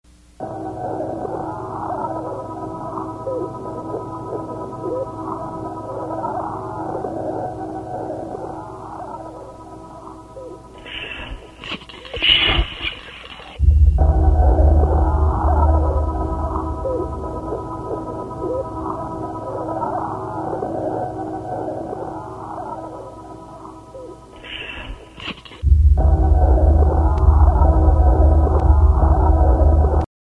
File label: Drone